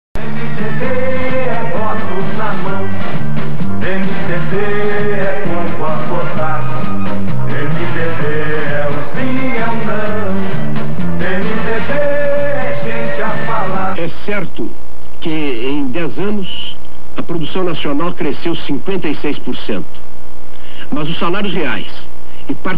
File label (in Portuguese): Jingle, jingles politicos; 1974